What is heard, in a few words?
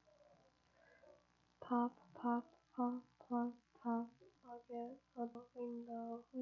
pop